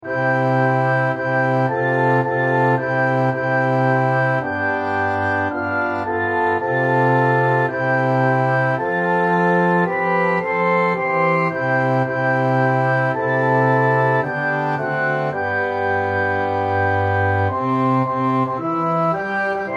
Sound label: Orchestra